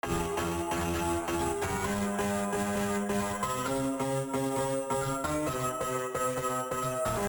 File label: paino